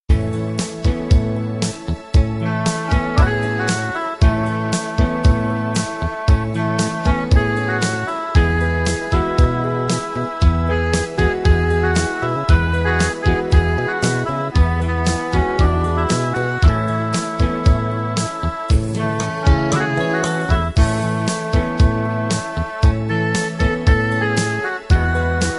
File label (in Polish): Cyganskie Skrzypce